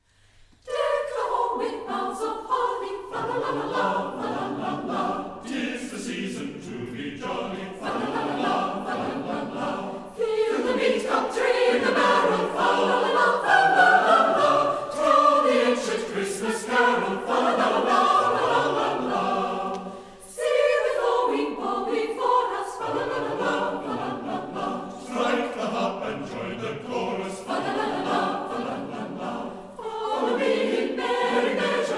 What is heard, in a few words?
Choir; Deck The Hall; Brisbane Concert Choir